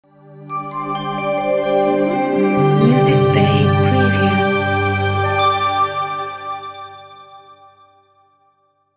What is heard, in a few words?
Dreamy Logo